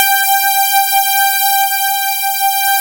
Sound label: JUP6 BUZZ; Analog Odyssey